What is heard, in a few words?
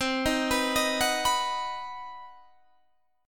C Minor Major 7th Flat 5th chord, Guitar Standard; arpeggiated; JGuitar